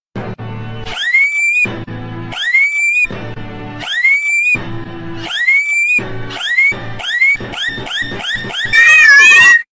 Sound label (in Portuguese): falsete